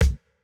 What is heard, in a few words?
Jrock kick